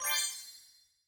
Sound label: drop rare silver